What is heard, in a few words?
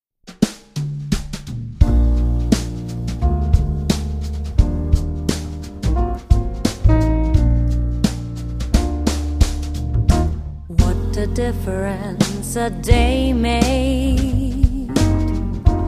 Pop Jazz